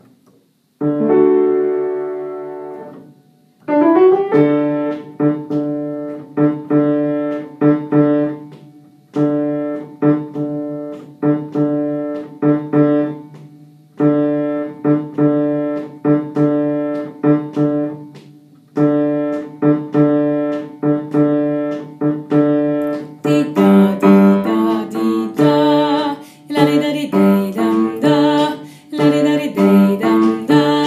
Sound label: Kom BASS